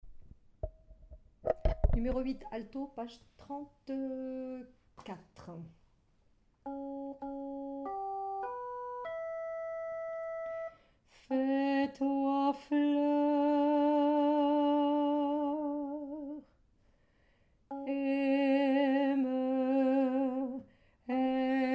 Alto; pop

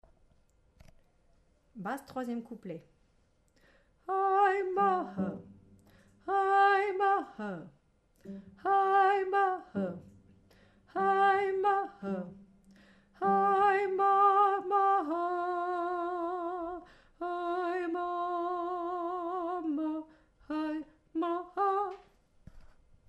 Basse

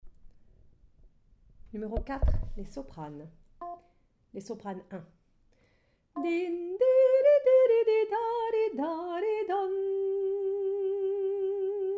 Soprano; pop

